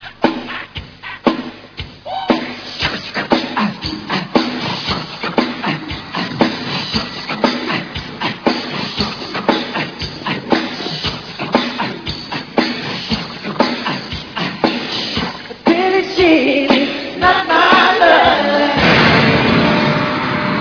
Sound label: beat box